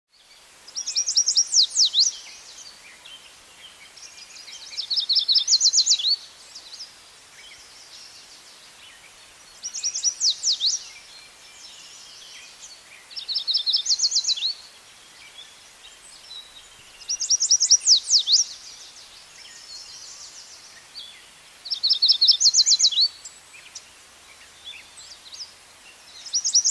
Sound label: Yellow Warbler Song; usa; birds of the usa